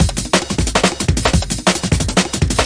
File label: 180 funky monkey, drum loops and breaks; 161 to 180 bpm